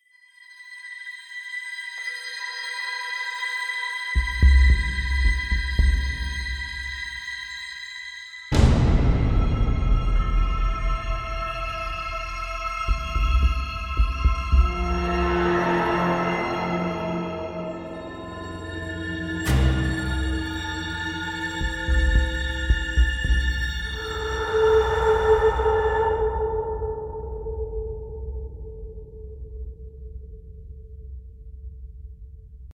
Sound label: Field BGM; Xenoblade Chronicles 3